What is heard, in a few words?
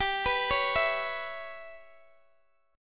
G 6th Flat 5th, Guitar, DADGAD